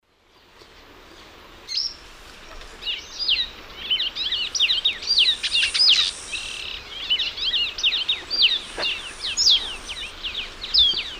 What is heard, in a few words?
Sporophila castaneiventris, song; S. America; Eastern Lowlands